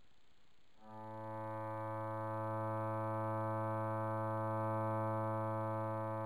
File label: bass A